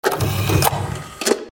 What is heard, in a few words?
shoot; tridroidznnpc